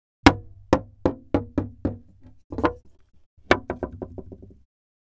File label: Rolling Thing On Wood